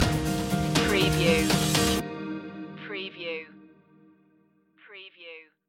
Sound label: spanish summer stinger